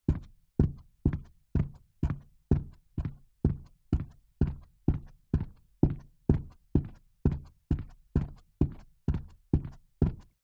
foley footsteps walking heels stiletto shoes rug on wood floor; zapsplat